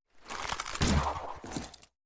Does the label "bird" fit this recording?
No